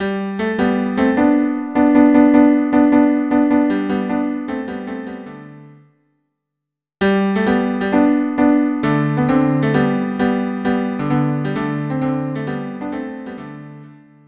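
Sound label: caprice cello duo; Corette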